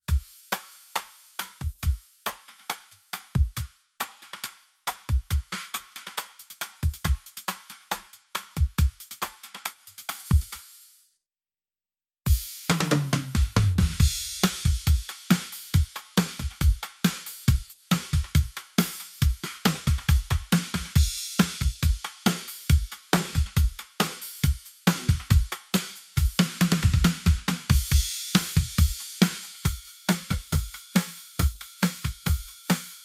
Drums

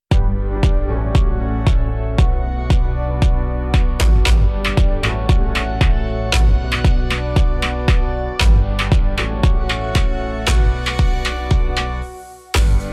Instrumental

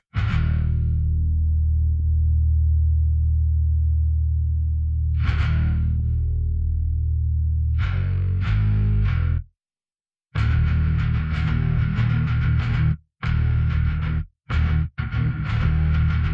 Bass

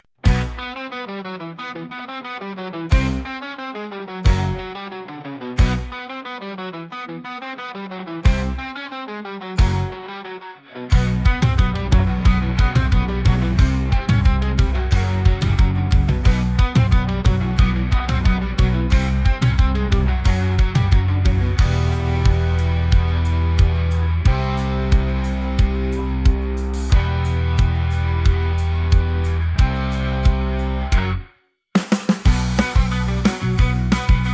Instrumental

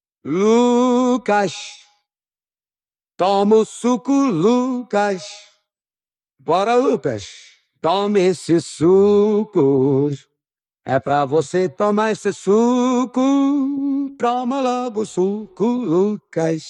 Vocals

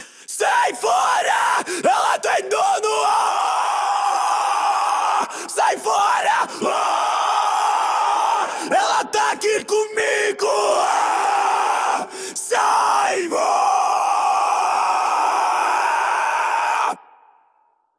Vocals

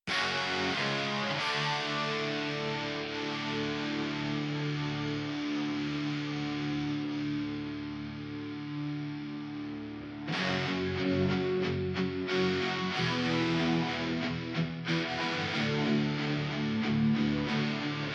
Guitar

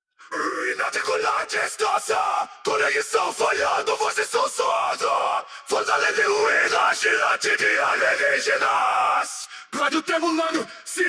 Vocals

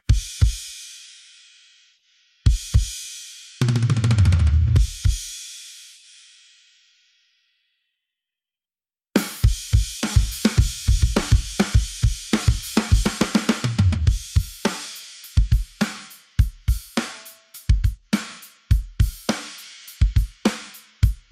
Drums

